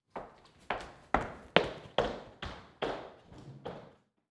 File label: Foley Footstep Hard Sole Run Away Between Surfaces; Foley Footsteps